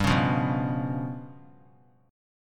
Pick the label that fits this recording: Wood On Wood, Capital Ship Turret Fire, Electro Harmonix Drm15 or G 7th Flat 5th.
G 7th Flat 5th